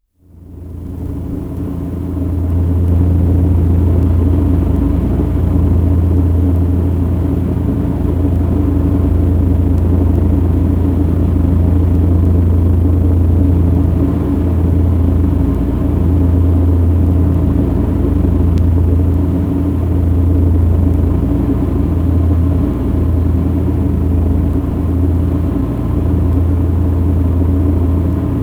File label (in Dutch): prop vliegt